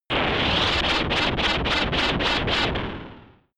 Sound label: shot wobble noisy tension; pulsieren